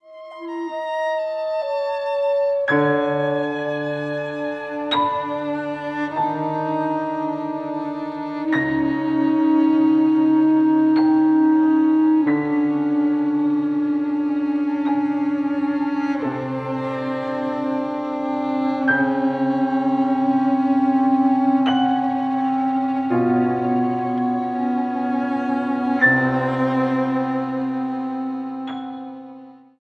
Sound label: Style modern smoke